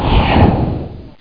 rocket

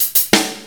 hihat

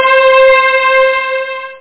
church string; OctaMED SoundStudio V1; strings

